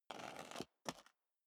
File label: step high heels concrete grit scuff; schritte; Footsteps; menschen